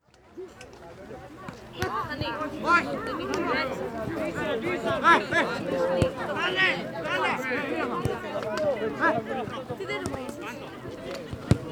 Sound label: Football match at Livadi; Αγώνας ποδοσφαίρου στο Λιβάδι